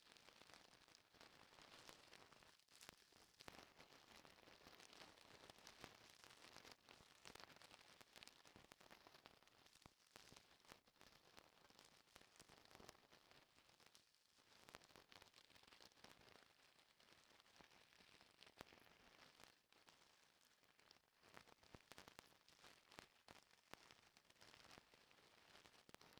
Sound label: mousse scrr